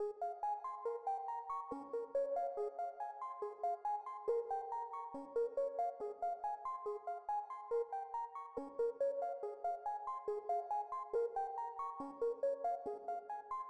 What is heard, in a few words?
Arp Lead